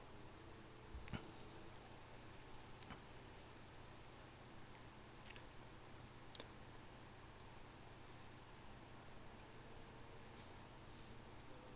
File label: Classic Vocal